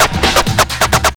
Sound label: scratch; Zero-G; Freak Beats